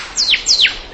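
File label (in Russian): звонкий свист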